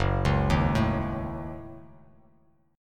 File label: Gb Minor 6th; arpeggiated; JGuitar